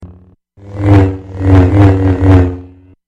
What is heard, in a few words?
Light Saber Sms